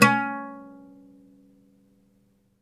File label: OUD C#3, Plucked Strings; E-MU Producer Series Vol. 7 – Old World Instruments